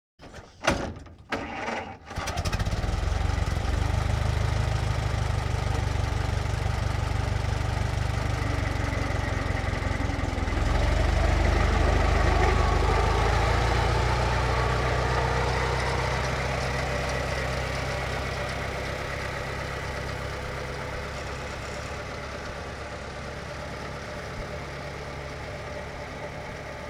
1924 Ford Stake Bed Truck Ext Start Idle Reverse Away Idle; Period Vehicles